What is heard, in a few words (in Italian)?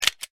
PISTOLA